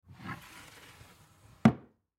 Cupboard Door Wood, Cupboard Door Wood Slide Shut Antique; Cabinet Door; Household; Blastwave